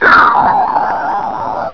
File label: death; sven; zombie